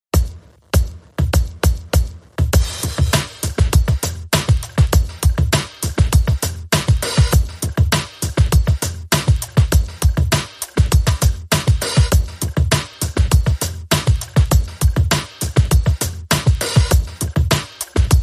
Drums and Percussion Only

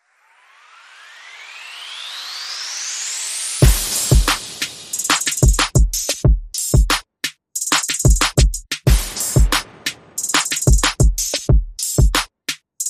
Perc and FX Only

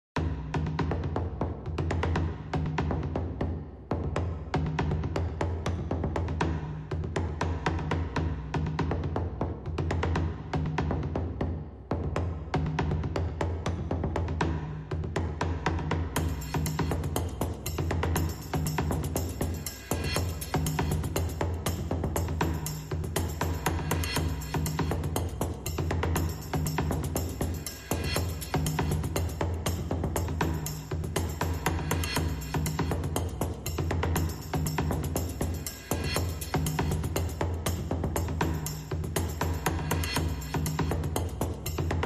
Drums and Perc Only

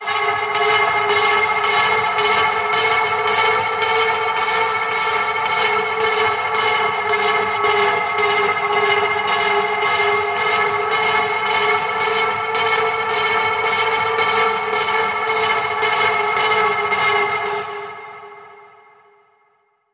Tension Pulse

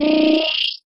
Quick Vibrating Transition

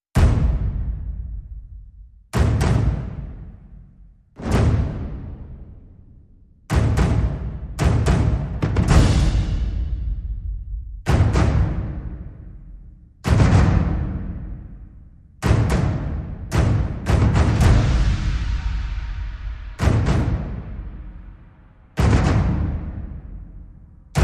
Perc Only

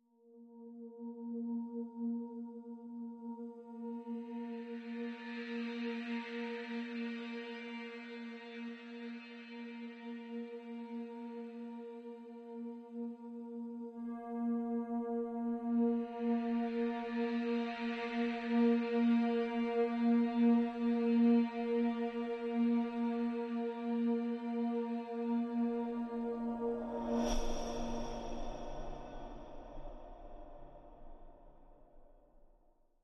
Pads Only

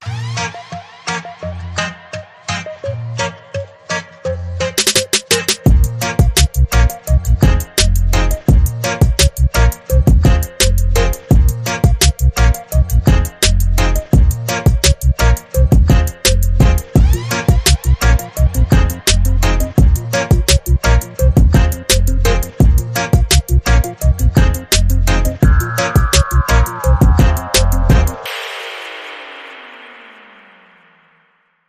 Beat

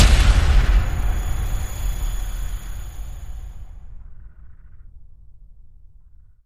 Hit Big Head Cracker

